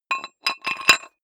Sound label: dumbbell thread put on weight quick; sport freizeit; fitnessstudio